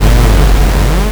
monster appear

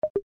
popup Close

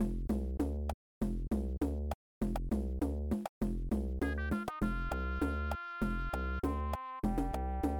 complete tune; jungle-book

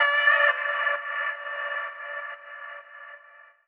voctone

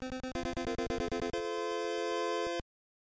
Victory; double-dragon

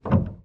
piano

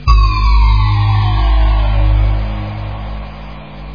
falling

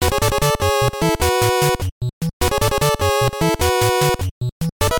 boss battle; rambo

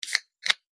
opencan

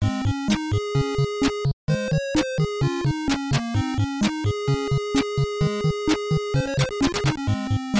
level theme; lemmings

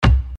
btn hover

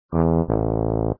finished Error; jclic

